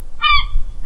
seagull Cry

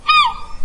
seagull Cry

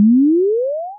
sine Raise Pitch One Sec, BWAH

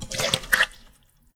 gut QWLPH

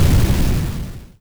fire Fade WRRR

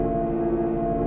slide Door

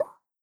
pop POP

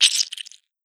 blood, SKLE

